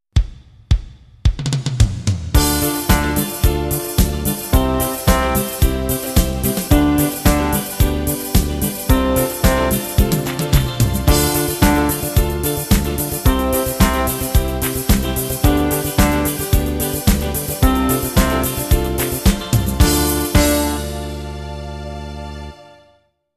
Flugan surrar, trombon trumpet

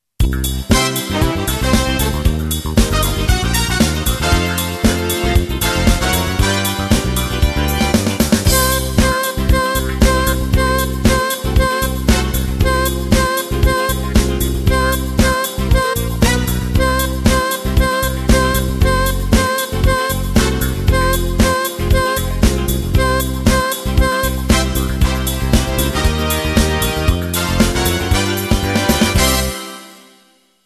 Mars, trombon trumpet

